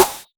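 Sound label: Snare hollow, Drumsynth Drums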